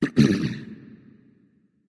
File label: Clear Throat; smite; osiris